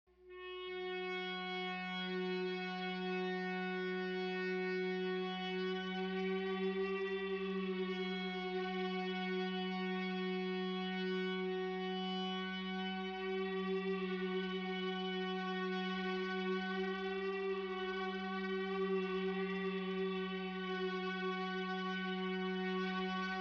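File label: guitars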